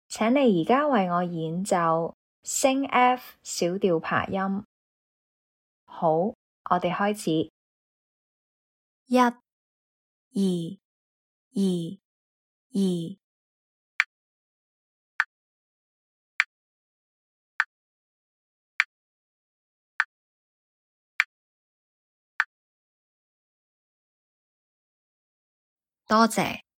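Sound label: F sharp minor arpeggio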